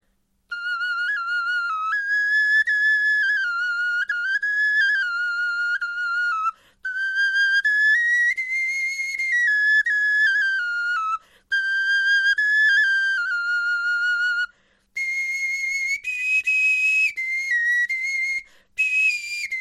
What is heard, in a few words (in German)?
melodie, knochenfloeten